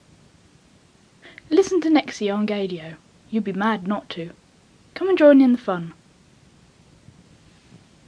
jingle

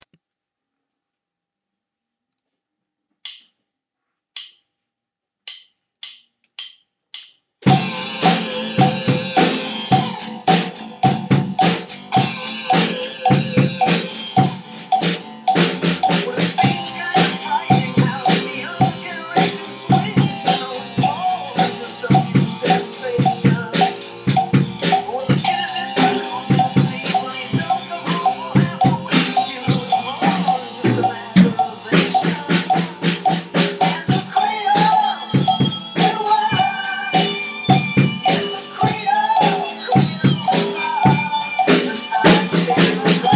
drum solo

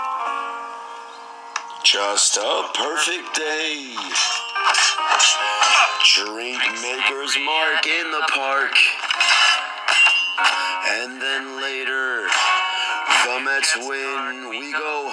chants; granderson

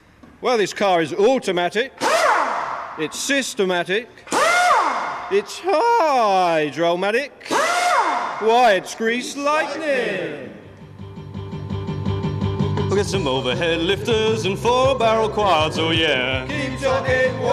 sings with southampton mechanics

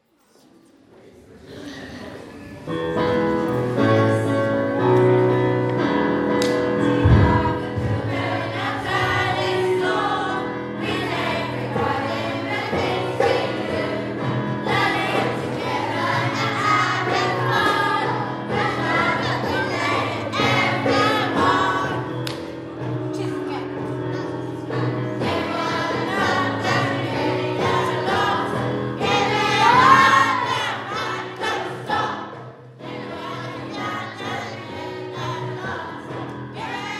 school choir at the leaver s assembly

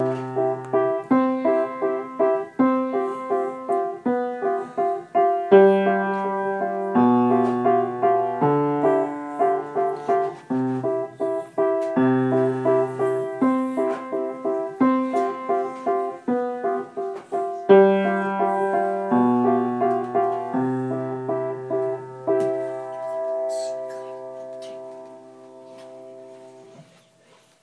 piano

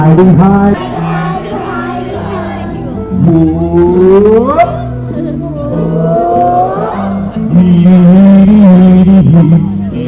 audience participation

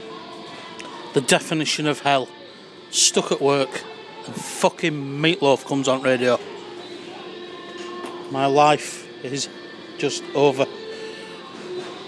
aaaaaaargh

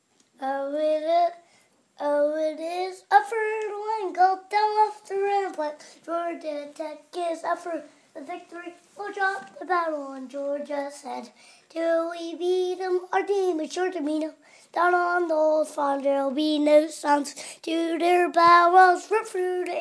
celebration song

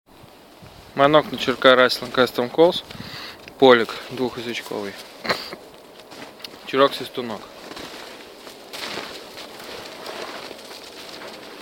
eurasian teal

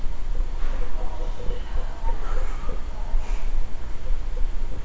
yelling in background and omg shhh; mansfield reformatory

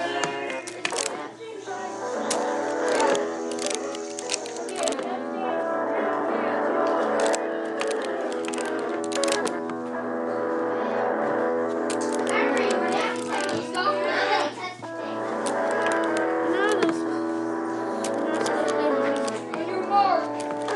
playing the guitar; all star guitar

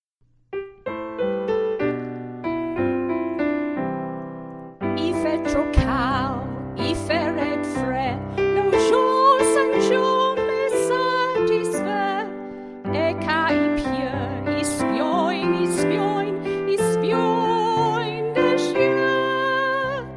le temps sung to piano

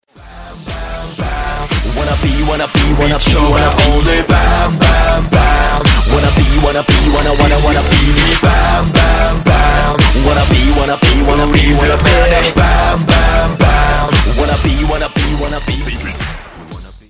ringtone

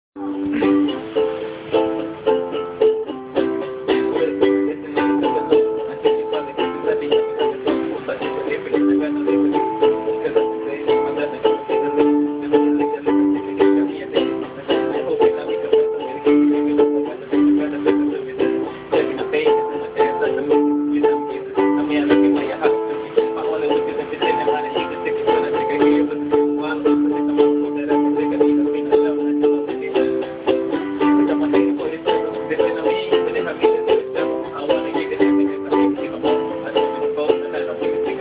a ukelele rap

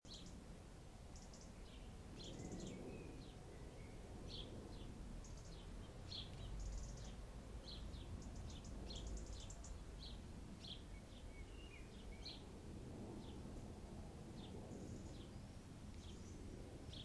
garden birds

